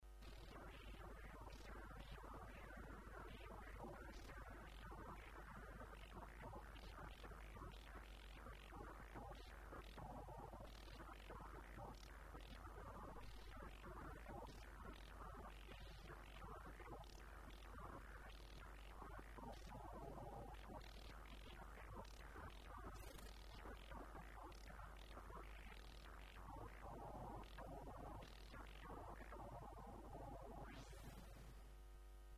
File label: Bass